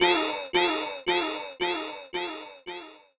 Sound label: die; scrapeboard